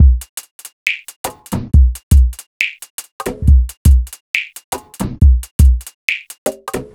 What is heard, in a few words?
Bpm138 Break, Dubstep, Drum Loops; Roots